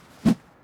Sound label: miner miss; jobs